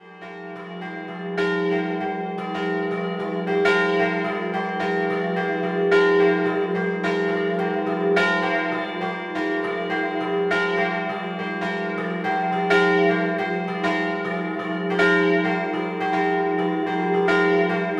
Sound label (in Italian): Scampanottata; Ligosullo; campane